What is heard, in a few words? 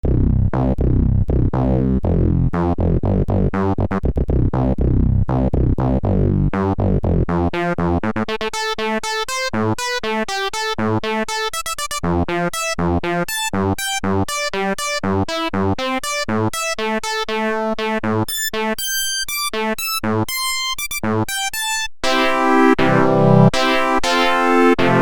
waldorf microwave xt filter lpfwavs q075